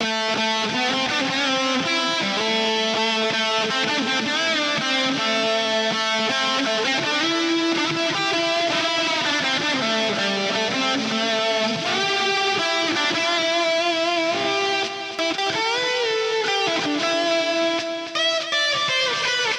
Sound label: Challenge Guitar Solo